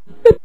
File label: bip